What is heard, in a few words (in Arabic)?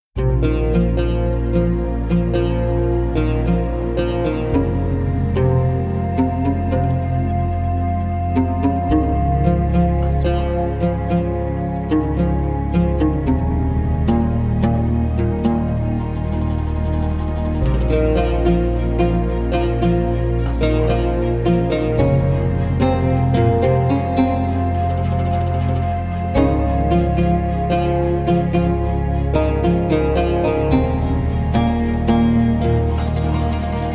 موسيقى تصويرية I سياحي I وثائقي